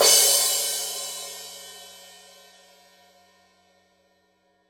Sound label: heavy crash edge